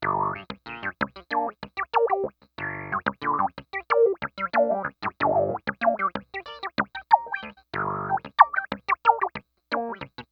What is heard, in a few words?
Clav Rev Auto Filter